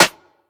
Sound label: snare cash; Hip-Hop; Drums; Drum One Shots; Slate; CAICOS Hip-Hop Sample Pack